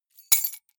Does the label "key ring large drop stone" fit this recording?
Yes